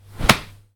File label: Kick Head